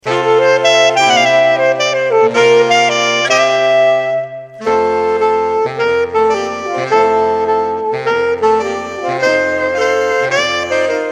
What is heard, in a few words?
raindrops, Saxophonquintette